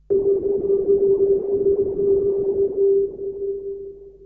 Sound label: Synth hits and riffs